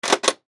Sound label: Steampunk Button, Scifi, Steampunk, Button, Switch; Science, Fiction; BlastWave